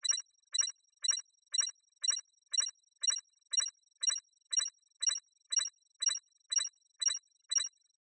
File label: science fiction computer scanning tone